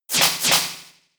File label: Fury Swipes; Pokémon SFX Gen 4 Attack Moves; DPPL HG SS